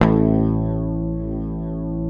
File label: PICKED, Bass, A1; Sampleheads; Will Lee Bass Library